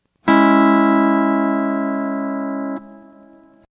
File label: c sharp m3, C6 Minor Chords, steel guitar